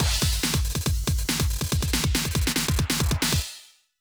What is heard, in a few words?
Jingle Mini Game